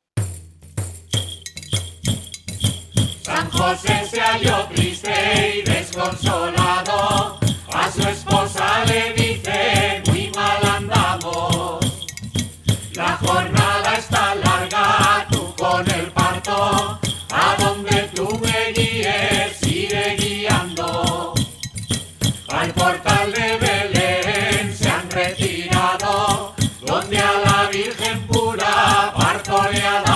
villancico aguinaldo

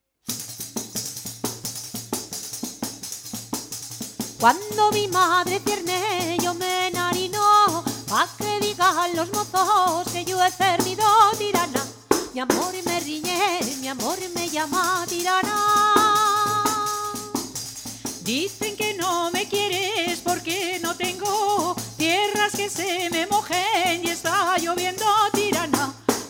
agudillo

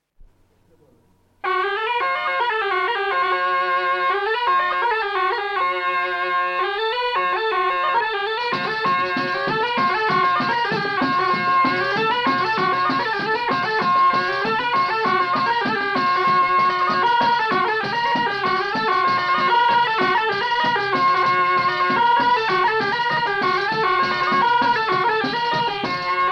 vascongadas arin arin

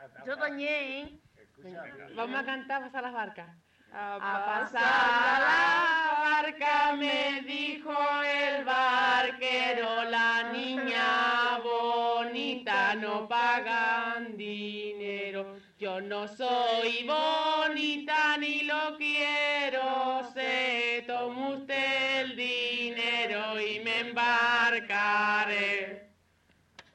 andalucia al pasar la barca

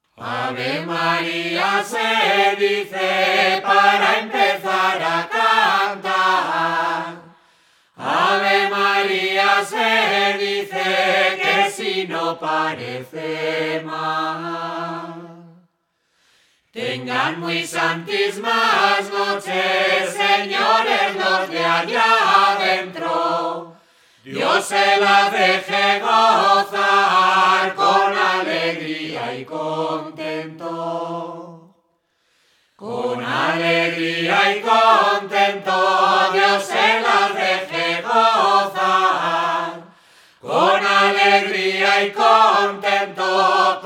agudillo y perrusalda

